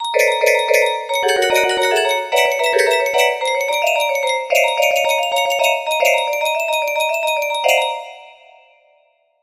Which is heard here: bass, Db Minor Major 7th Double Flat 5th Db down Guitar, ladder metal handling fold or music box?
music box